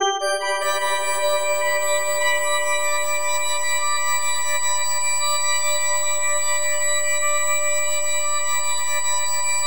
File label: FM G MAJ; Bolder Sounds